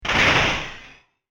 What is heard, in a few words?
Heavy Glass And Metal Impact Short Crash; Glass Sound Effects